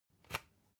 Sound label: usb port metallic out